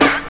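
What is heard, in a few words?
SD, snare drum; X-Static Goldmine 1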